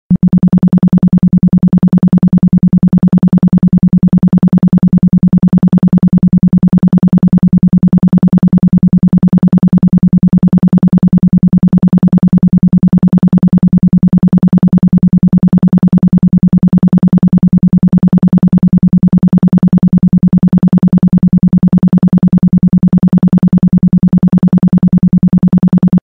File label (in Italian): 1796 hz isocronico; procrastinare migliora la socievolezza padronanza della vita